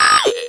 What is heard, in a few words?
speak n spell ayyee, CIRCUITBENT; Speak and Spell